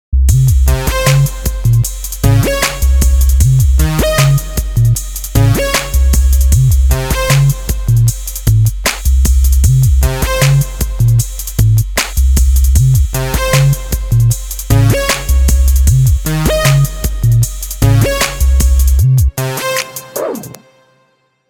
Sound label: Solid Comp